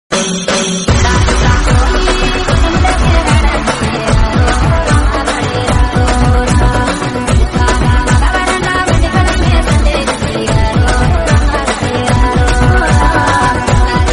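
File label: Kerala traditional remix background music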